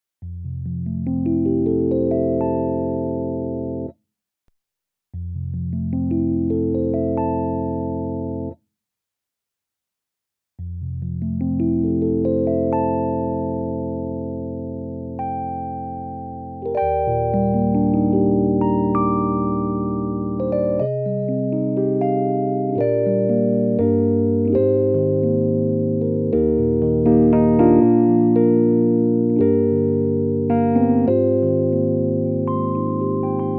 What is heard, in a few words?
Chorus Bypass LFO Amount 0 LFO Amount 5